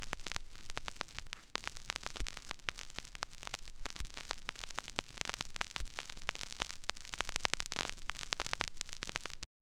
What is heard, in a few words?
scratching repeatedly; sound effect